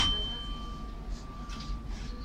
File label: ding, Metro